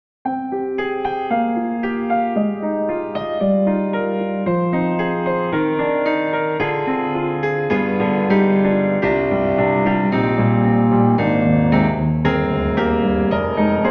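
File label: Closed Lid, piano; Steinberg HALion 6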